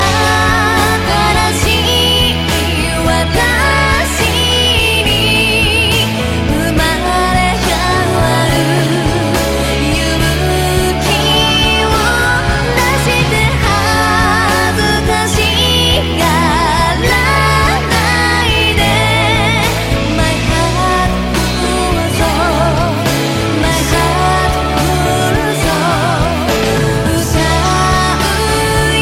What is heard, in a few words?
Heartfull Song